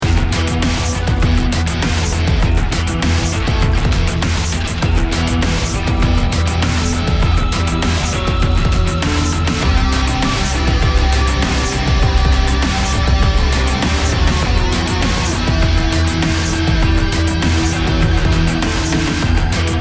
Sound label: Rock